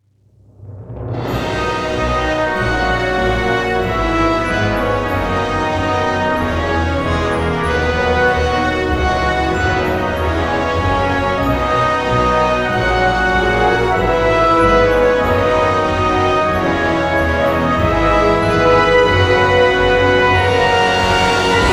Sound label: 94-387BPM 4-4; Emma; Evil Genius 2 World Domination; PS4; Xbox Series X/S